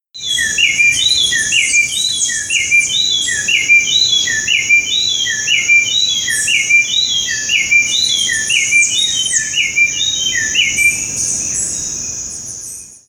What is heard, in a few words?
ambulance